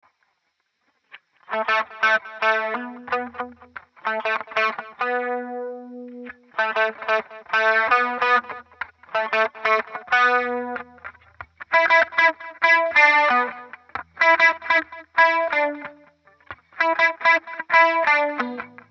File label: Guitar